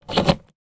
household small key in double glazed window handle turn to open